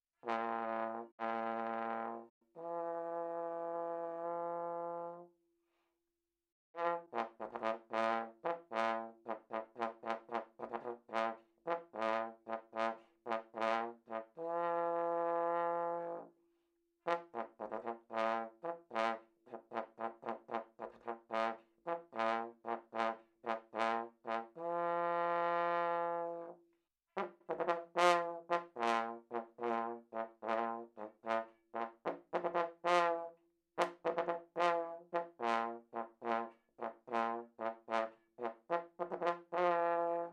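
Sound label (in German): Begrüßung, Allgemeine Signale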